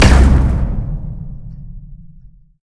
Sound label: pwr fire; smite; Anhur